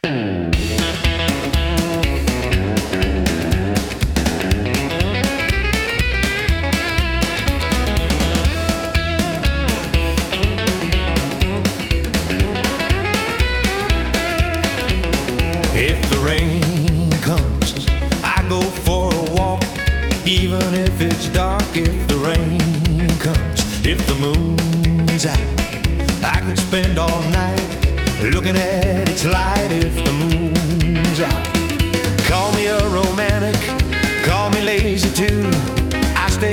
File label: Rockabilly